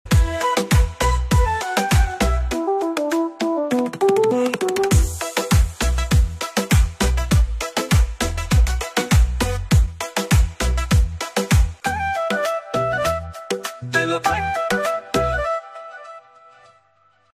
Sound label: flute bgm ringtone